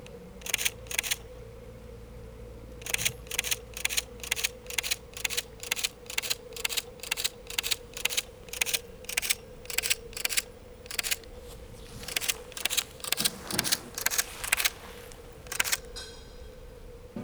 sorozat, fényképezőgép digitális; vízház; cardioid

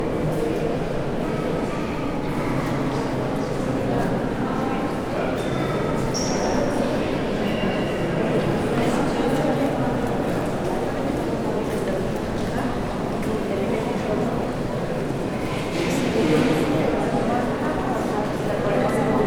oszloperdo meszkita

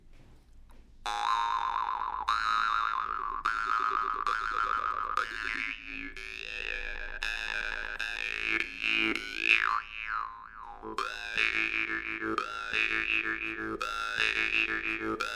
doromb

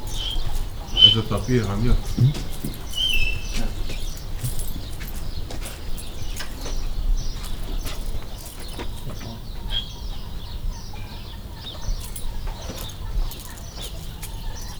ez a tapir hangja, kozonseges tapir; debreceni zoo 2012; marantz puska

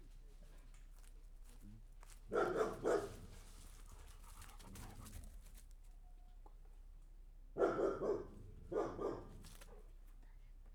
falubelieket megugato kutyak; varboc

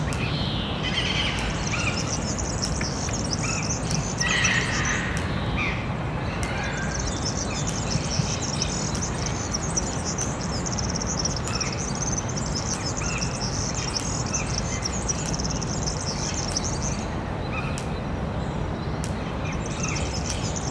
balrol sziklapapagaj jobbrol villanypasztor, mezei pacsirta; debreceni zoo 2012

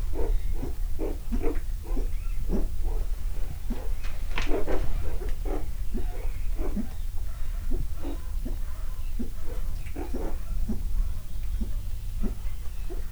evés után, szurikáta; állathangok; miskolci zoo 2009

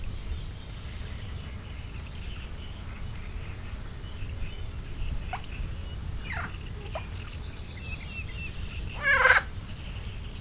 vartyog dongo mezei tucsok, kecskebeka; termeszetben

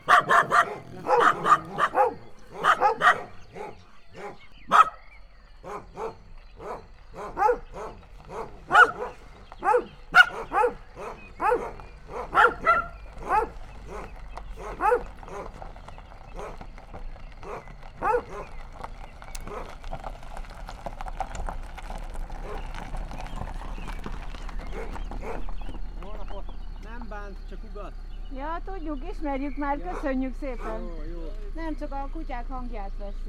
nem bánt, kutya; Egerturistaház; termeszetben

